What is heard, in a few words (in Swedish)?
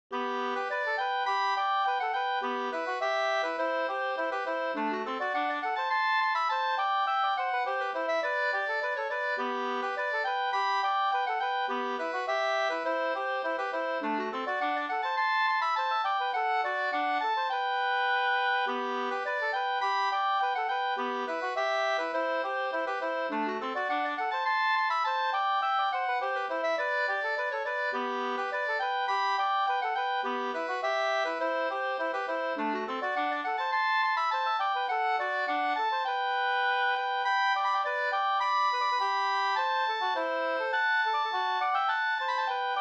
polkett; Gladare an du tror e August Bohlin Up 2st